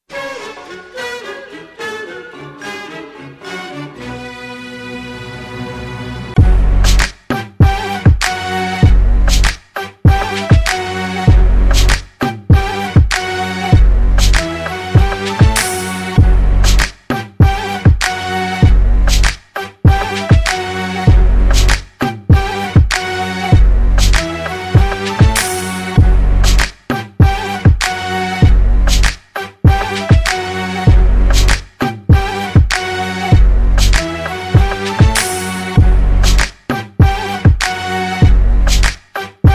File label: instrumental